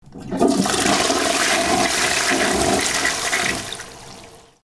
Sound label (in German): toilettenspuelung